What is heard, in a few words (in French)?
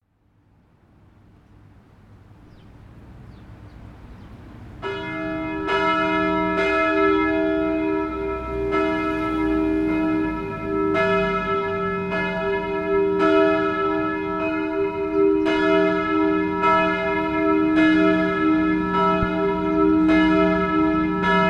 Moudon cloche; Volée de midi; campanologie